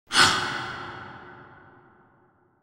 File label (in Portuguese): respiro pesado